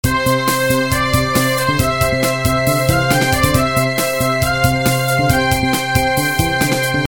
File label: midi